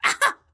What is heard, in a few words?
death hit; Collector; Hollow Knight